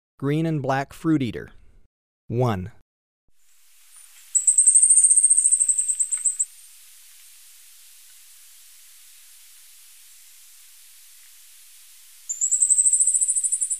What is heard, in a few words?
Green-and-black Fruiteater, Birds of Ecuador; S. America